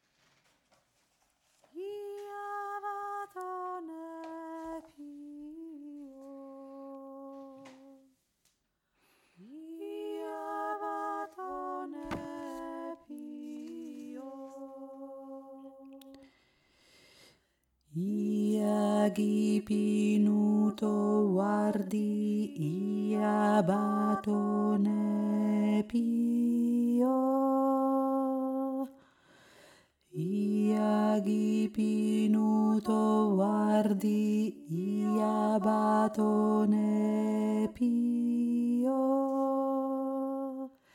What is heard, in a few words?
ia batonepi o tiefe stimme